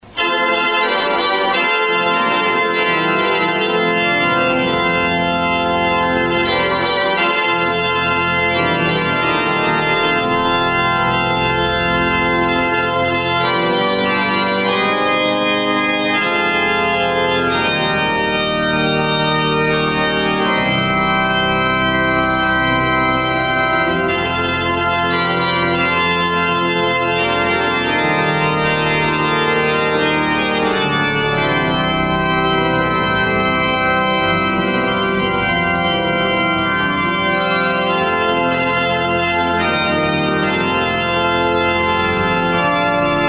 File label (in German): Fanfare; Alle Orgelstücke sind in der neu renovierten Kirche St.Laurentius in Sollenau im April 200; improvisation